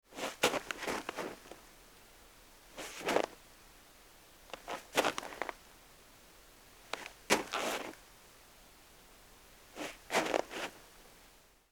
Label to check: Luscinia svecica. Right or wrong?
Wrong